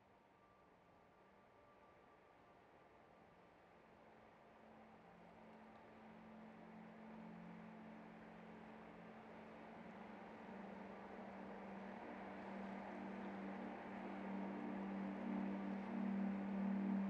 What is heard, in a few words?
Spa Classical Lullabies Ambient Forest